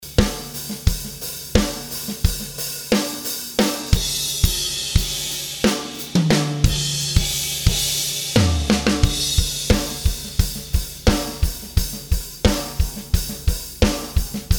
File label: drums